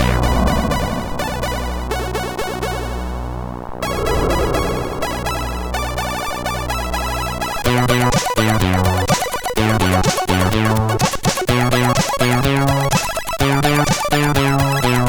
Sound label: Mars Disco, CSG8580R5